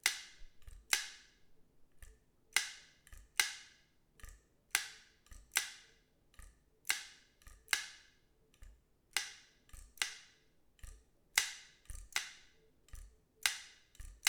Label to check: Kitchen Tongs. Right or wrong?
Right